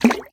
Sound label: watercan splash small; minetest; crops